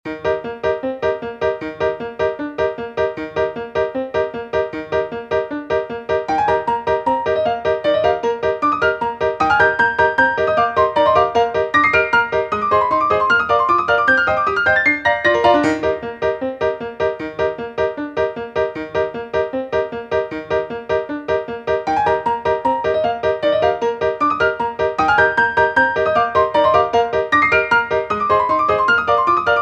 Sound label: piano; 疾走 にぎやか コミカル; 煙に包まれながらケンカしてるシーンなんか好き。